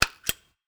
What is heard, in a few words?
spin Start; netent